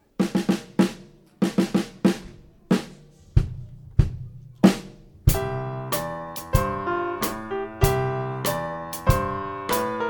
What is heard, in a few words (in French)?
Blues en C